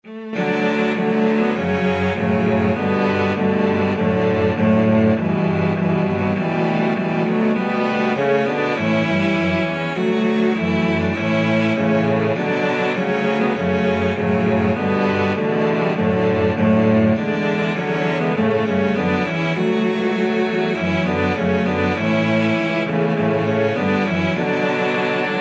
national anthem russia, cello quartet

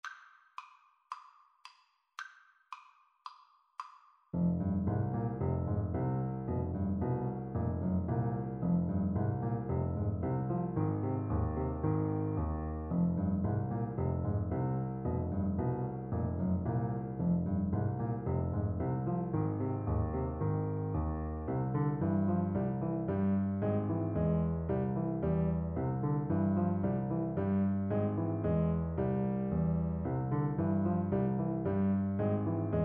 grieg mountain king, Saxophone

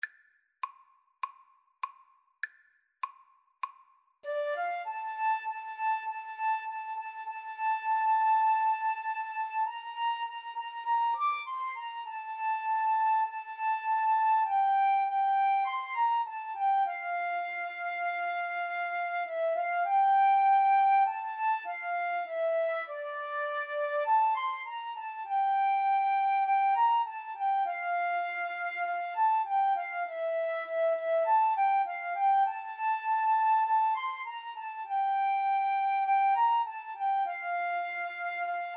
Flute duet

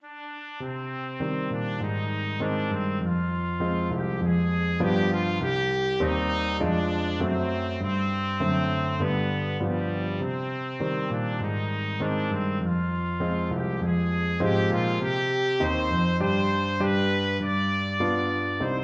back to the hills trumpet

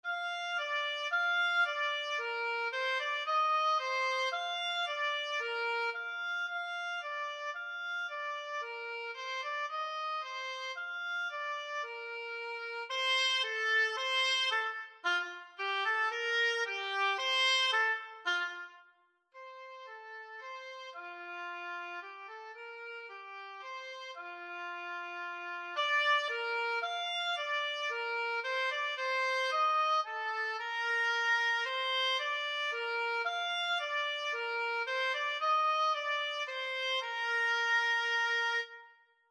oranges and lemons, oboe

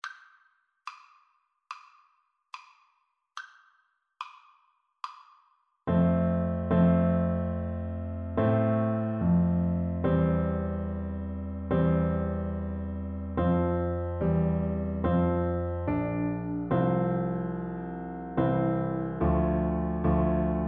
o come, Tuba

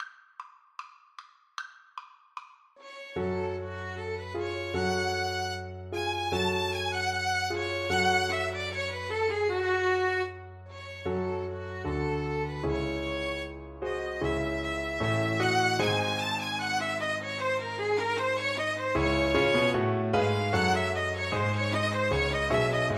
violin duet

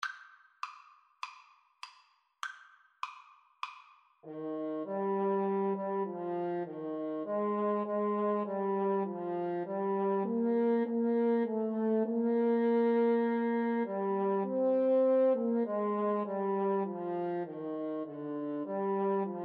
Trumpet French Horn Duet

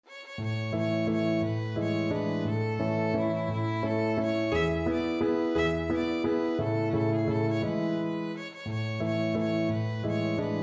german dance, violin; schubert

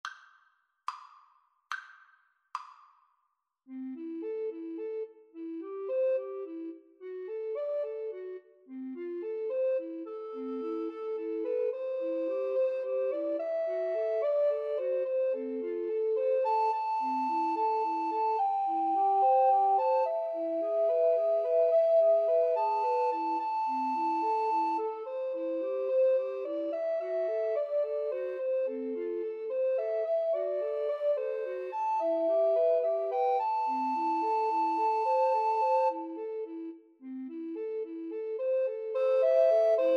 house of the rising sun, Recorder Trio

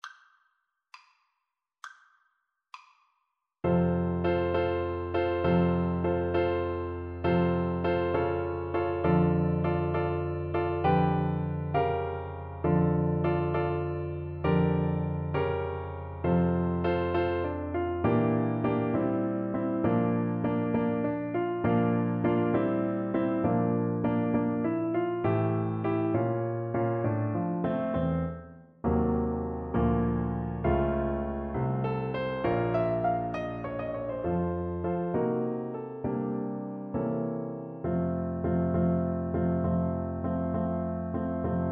sheherezade, Trombone

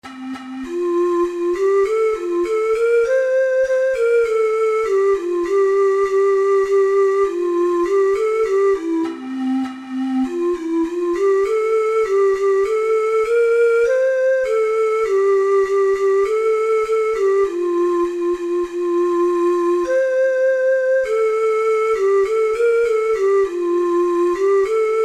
simple gifts, ocarina four hole

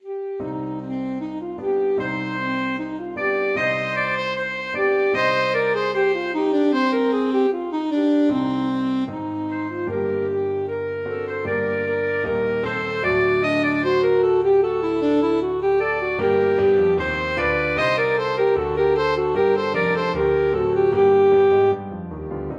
alto saxophone duet

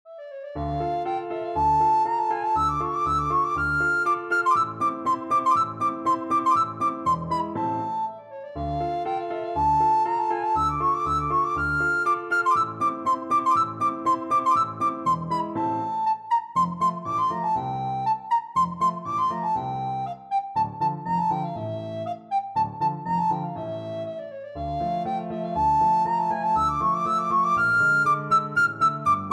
recorder

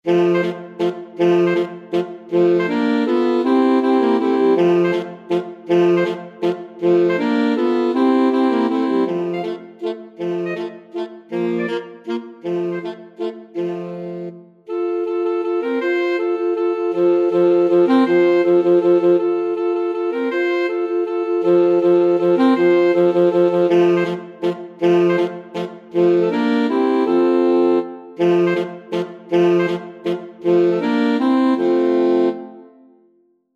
alto sax quartet

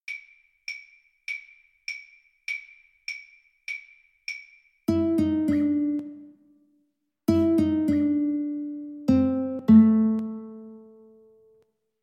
Guitar Duet

